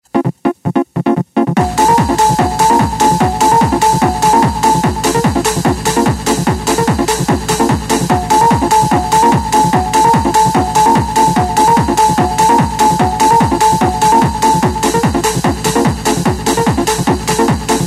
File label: tono the bells